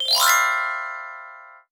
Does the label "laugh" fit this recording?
No